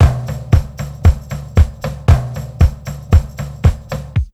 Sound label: TRIB BEAT, Roots Disco; USB Soundscan vol.32; AKAI